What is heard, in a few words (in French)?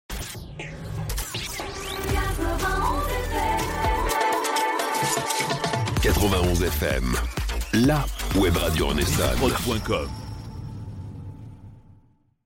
Jingle Chante A Voix Parlee, chant; 91 FM